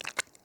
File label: Dung Hatchling Emerge; Hollow Knight